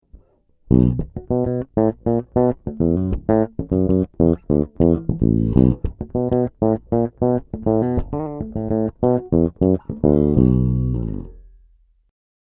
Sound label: nature shock fusion5 passive bridge, bass